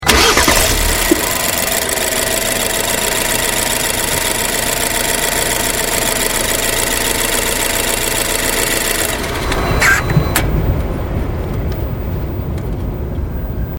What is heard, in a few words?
428i Ignition Outside